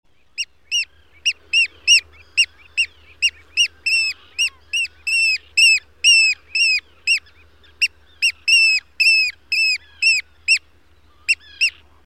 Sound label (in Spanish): ostrero euroasiatico